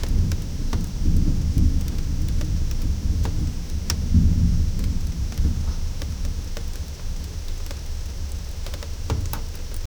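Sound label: audio ambience only indoor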